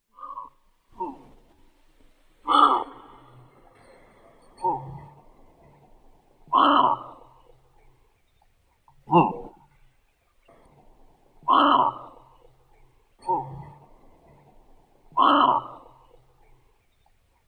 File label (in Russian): zvuki kau pohozhe na podrazhanie